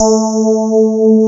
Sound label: SPARKLES; Wizoo - Powered DX